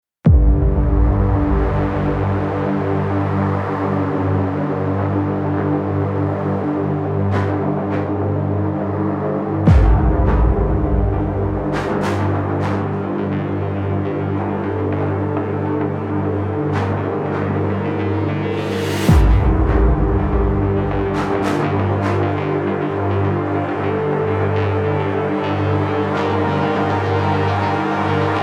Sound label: Dystopian, Orchestral and Electro Hy; Media Composer